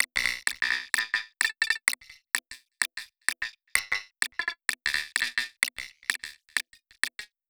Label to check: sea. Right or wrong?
Wrong